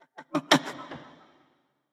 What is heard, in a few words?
surprised; Smite; bakasura